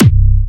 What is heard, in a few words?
Kicks, Big Room Kicks, C4